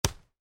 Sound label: Volleyball (Exterior): Block Soft; Sports; Miscellaneous Sports